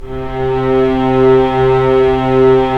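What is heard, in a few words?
STR String Section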